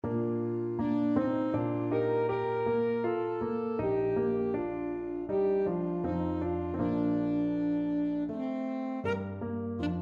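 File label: dance alto saxophone; gluck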